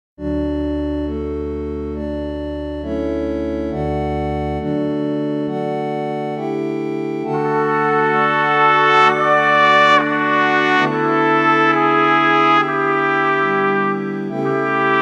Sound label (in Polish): 2 trabki; ave verum